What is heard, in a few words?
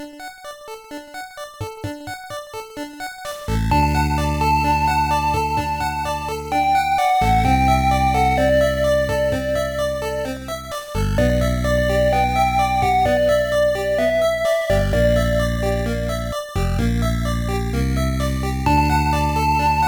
Music - BGM; fushigi no umi no nadia the secret of blue water; pc engine cd; turbografx 16